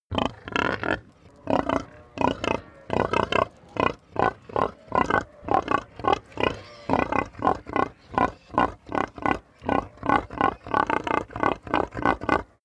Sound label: pig grunt crowd busy